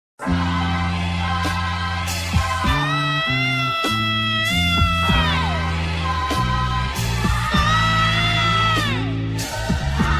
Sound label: song